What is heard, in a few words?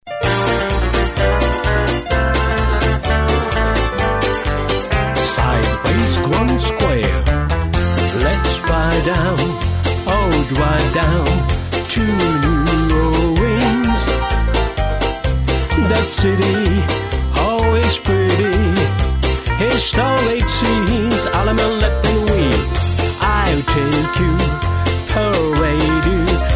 vocal